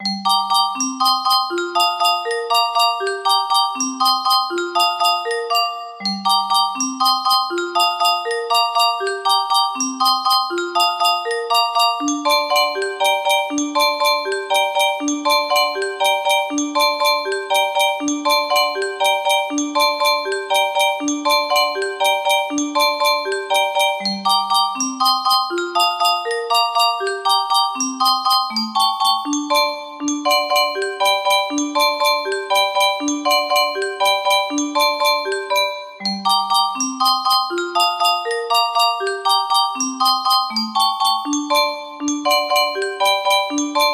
music box

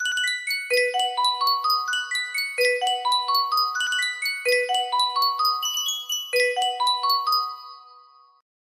Sankyo miniature music box, music box melody

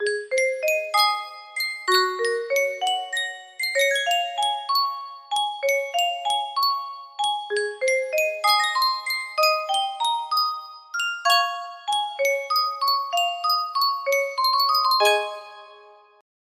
yunsheng music box

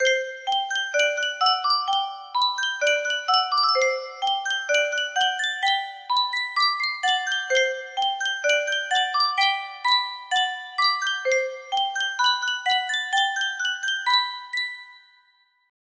Sankyo music box, music box melody

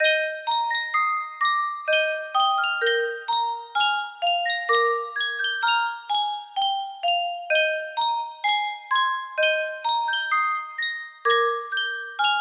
Sankyo Music Box, music box melody

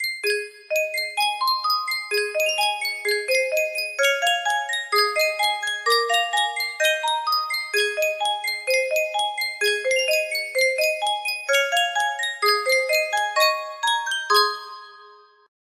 Sankyo Custom Tune Music Box, music box melody

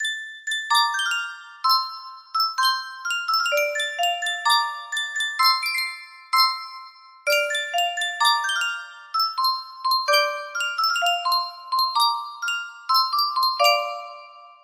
Sankyo music box

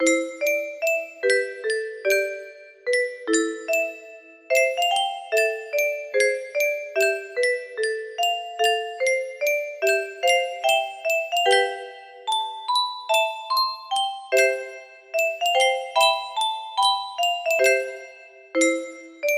fun music box

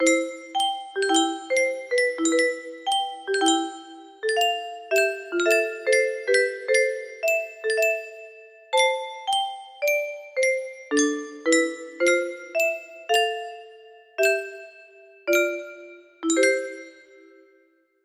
30 keys, music box melody

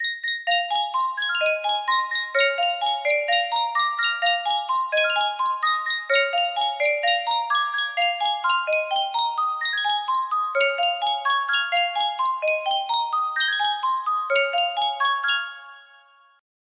Yunsheng music box, music box melody

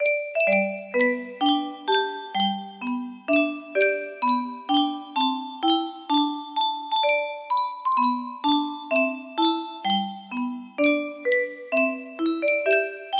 Yunsheng Music Box, Unknown Tune

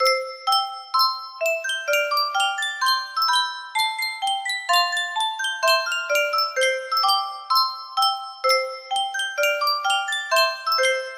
yunsheng spieluhr, music box melody; mozart

